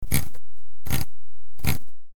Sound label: Dial